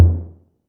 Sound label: tom low, drums; Playdate SDK; MIDI Player